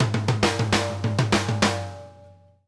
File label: Drumworks SP fills 132